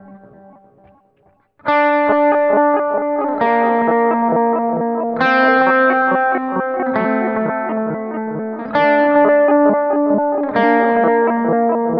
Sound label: wave